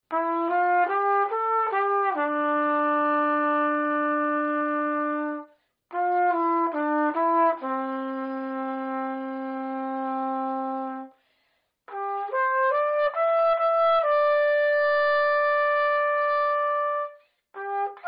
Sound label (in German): Trompete